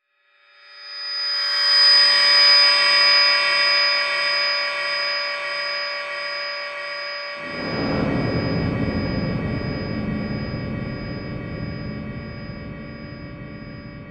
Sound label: Electronic Whoosh Reverse Feedback Digital; Adobe; Production Element; Imaging